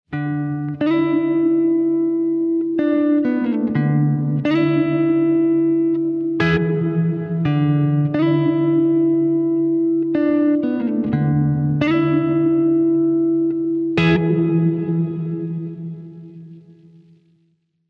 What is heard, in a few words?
dark long plate; teraecho